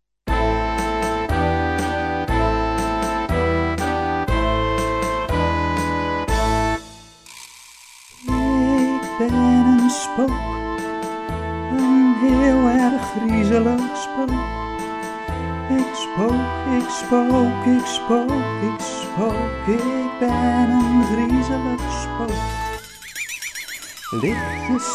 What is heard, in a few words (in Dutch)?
Blokfluit Spook, recorder ghost